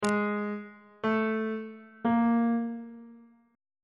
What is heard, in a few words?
d 5th intervals on bass clef